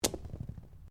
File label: Dice Mixed Carpet Throw